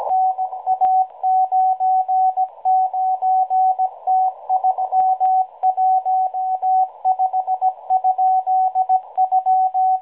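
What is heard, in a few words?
Air Def, 5221.5kHz; Russ; websdr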